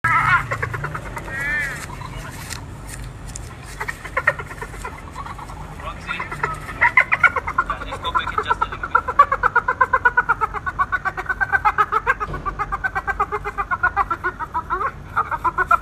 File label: Hyena laughing